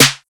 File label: snare vibrant, Drum Sample Pack, One Shots, Snare; SEISMIC Drum Sample Pack; Slate